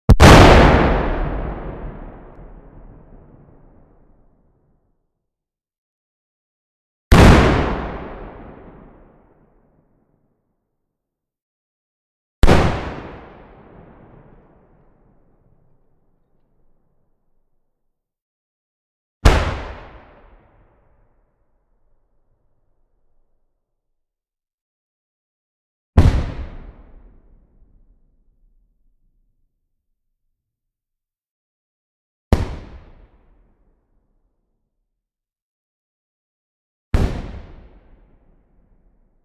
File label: explode; Zomboid 41.78.16; Project Zomboid